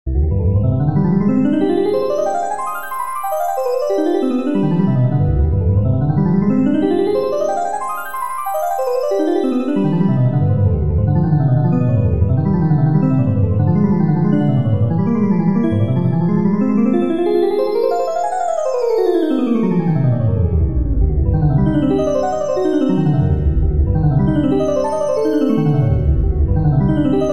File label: for electric piano; Saint-Saens, Camille; wild asses